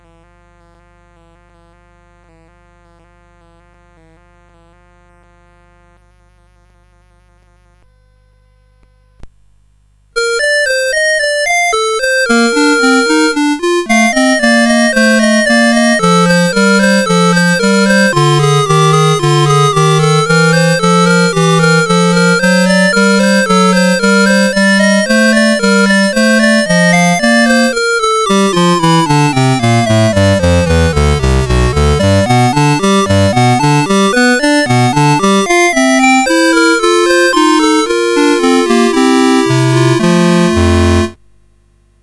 Prelude and Fugue in B Flat Major, CSG8580R5

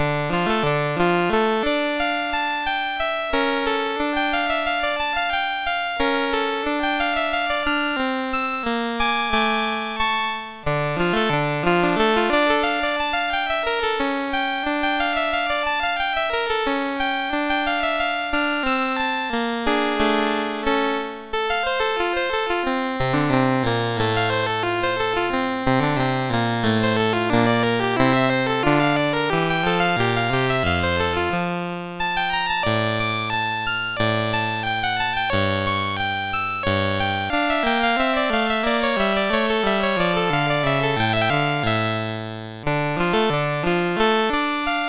Capriccio, MOS6581R4

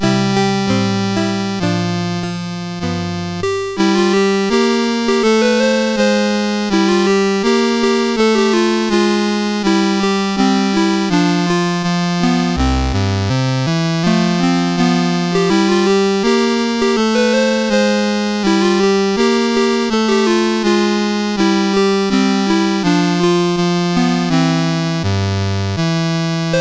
CSG8580R5

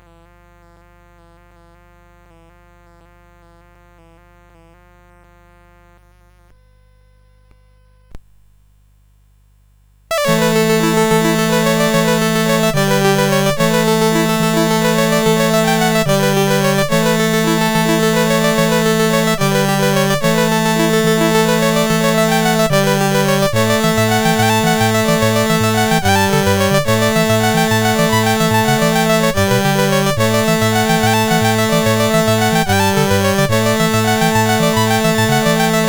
Mooncoin Jig, CSG8580R5

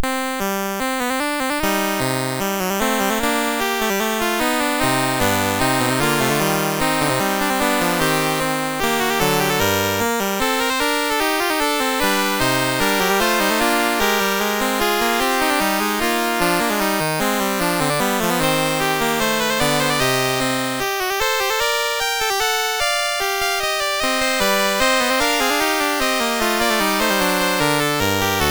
Fugue, MOS6581R3

